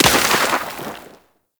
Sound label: Trench Small Dirt Impact; Gameplay; Warframe; Tau